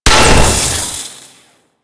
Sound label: Pipe Blow B; Warframe; Grineer Hammer Boss; Pipes